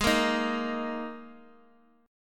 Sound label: Gsharp Suspended 2nd, Guitar, Open D; x,x,x,2,1,1